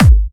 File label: Bassdrums UK Trance Kicks; Vengeance Samples